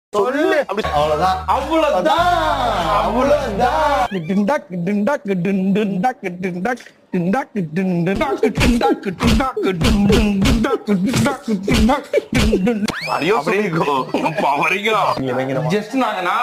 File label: rap tamil song music; VJ Siddhu Vlogs